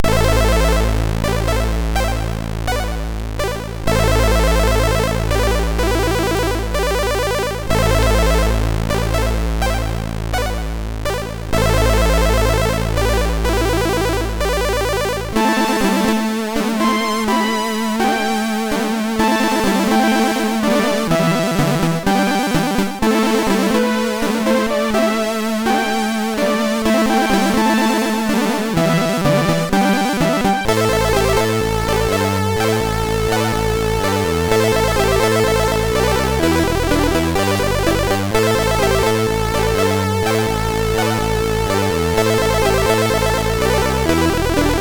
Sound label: Blow Flight, MOS6581R3